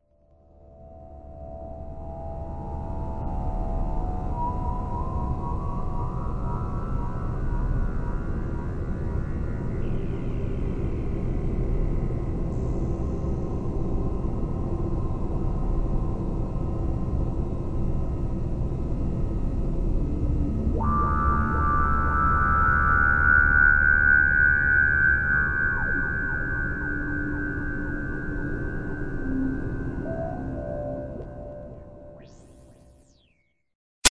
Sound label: ping